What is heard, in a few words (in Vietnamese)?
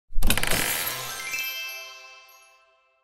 Am thanh mo chiec hop than ki